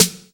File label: airy snare f key